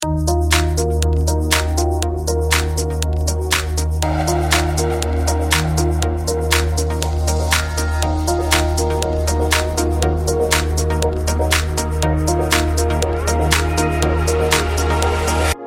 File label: Mobile Ringtone